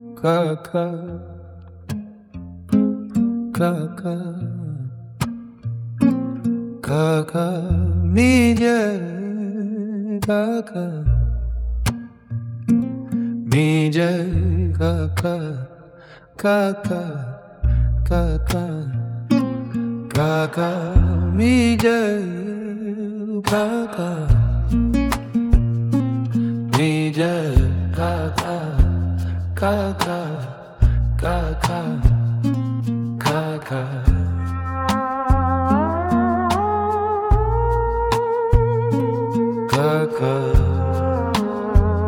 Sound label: acoustic